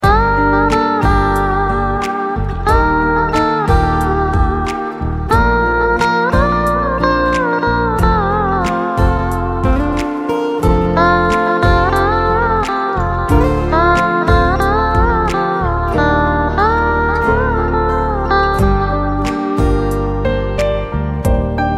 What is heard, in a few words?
Instrumental Film Songs